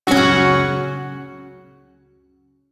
Shawzin Chromatic Chord D; Warframe; Duviri Shawzin

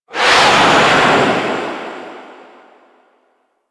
Sandstorm Gust Enemy Pickup; Warframe; Mummy

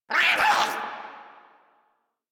Attack Effort; Grineer Jester

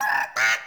Sprout Vocal Surprised; Friendly; Sproutfolk; Fey Hub Sprout

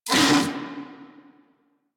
Amored Vocal Pain; Enemies; Armored; Warframe; Kubrow

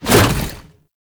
Infested Hammer Idle Whoosh, Weapons

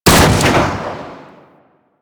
Sniper Rifle Shot; Warframe; Tenno

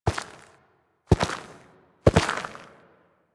Steps; Cinematics; Shrine Intro; Ballas; Warframe; Sacrifice

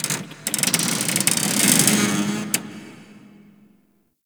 Ocean Close Metal Creaks; Warframe; Grineer Ocean

